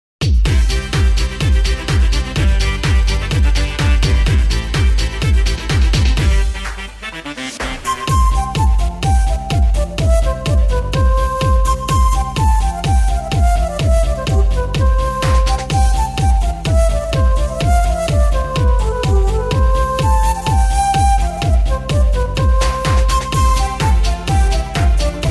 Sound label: QUICKSTEP